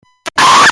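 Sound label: screeeeeeam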